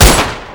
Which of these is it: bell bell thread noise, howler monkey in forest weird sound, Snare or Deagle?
Deagle